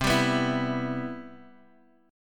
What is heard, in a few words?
C Major 7th, Guitar Open D